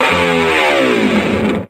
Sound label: guitar slide